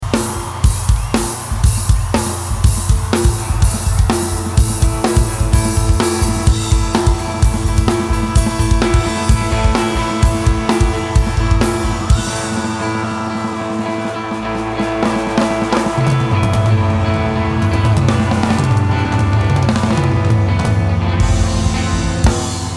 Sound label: Drum Solo